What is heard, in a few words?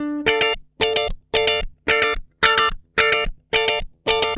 GUI, 110 BPM